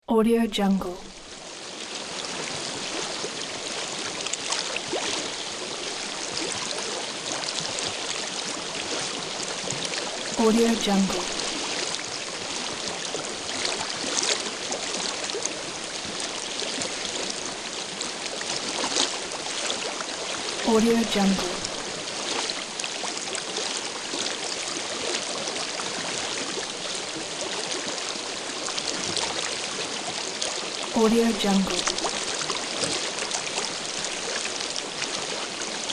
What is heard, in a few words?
flowing water